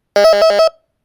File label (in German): batteriekontrollton; xp